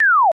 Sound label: string; tuxpaint; magic